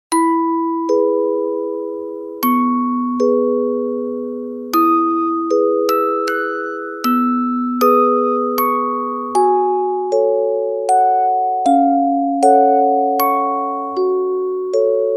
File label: orgel